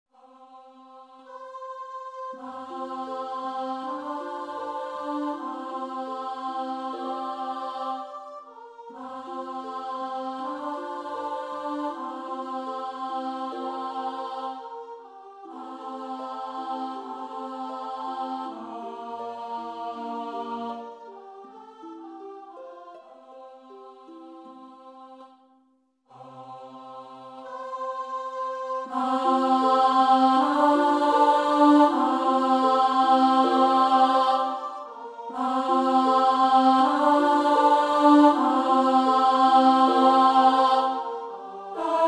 Alto

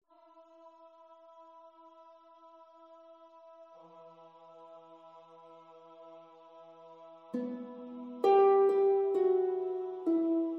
Lament Sop

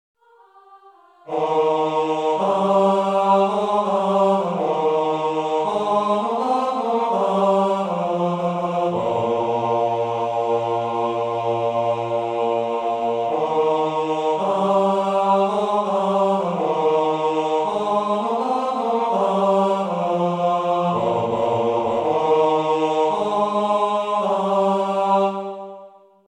Bass

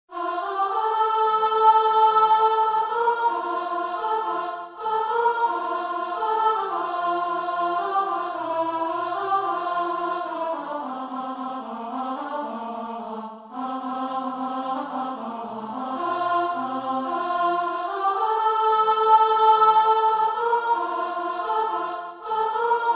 Alto from Chorus

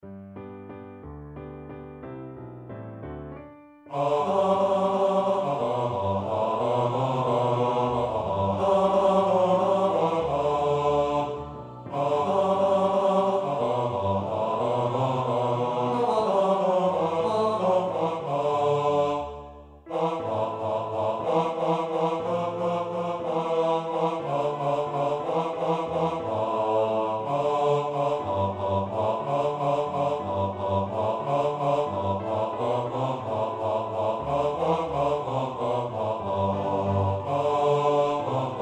Bass

